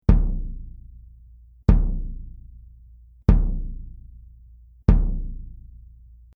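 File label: BASS Clr Soft Felt, bass drum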